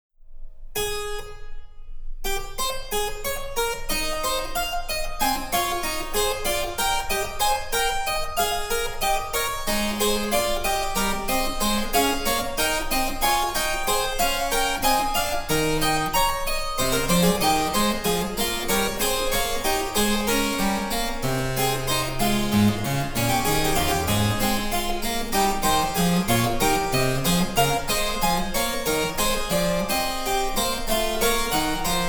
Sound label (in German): Cembalo; Mark Lindley WTC 1